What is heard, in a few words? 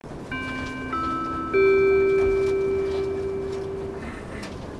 chime; odakyudentetsu